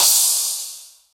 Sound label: long room reverb urban crash sound c key, Royality free crash single hit tuned to the C note